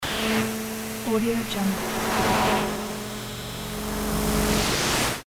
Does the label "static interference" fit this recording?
Yes